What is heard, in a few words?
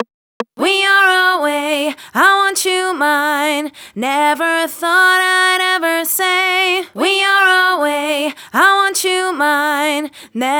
Chorus Dubbed, 150BPM; Vengeance Samples